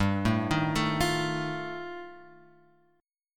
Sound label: G 7th Suspended 2nd Sharp 5th G; G7sus2#5 Chord {3 0 1 2 X 1} arpeggiated